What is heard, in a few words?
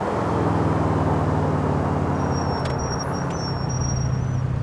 throttle off slow speed, vehicles; premier